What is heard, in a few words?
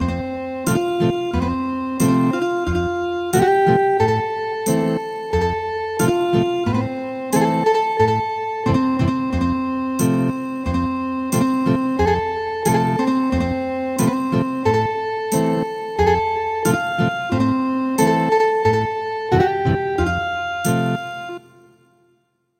Ethnic Guitar; A duck